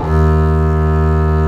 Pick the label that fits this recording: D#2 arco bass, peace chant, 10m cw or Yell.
D#2 arco bass